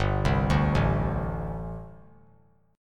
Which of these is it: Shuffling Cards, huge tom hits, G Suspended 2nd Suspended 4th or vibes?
G Suspended 2nd Suspended 4th